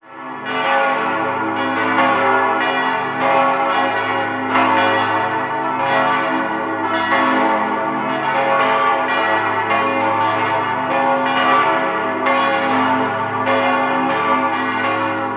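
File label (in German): Geläute vor und nach wirksamem Klöppelaustausch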